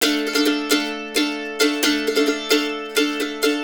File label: 132, Blues Guitar RIFF